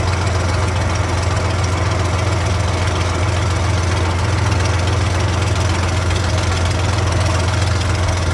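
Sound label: Plane P40 Interior Taxi Loop; Airplanes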